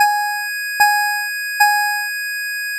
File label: bells, nes sound generator